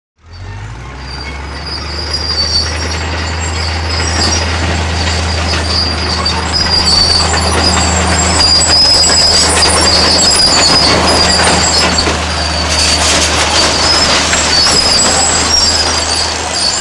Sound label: Bulldozer; Bulldozer Start Up Off Mic, Approach, Passes Slow With Small Revs And Switch Off.